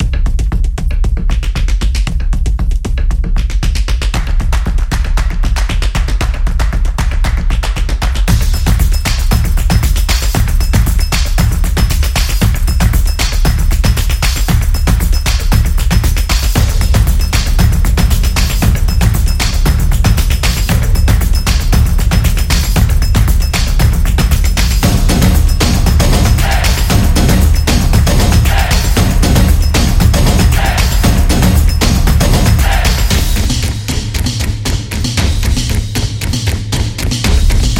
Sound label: Festive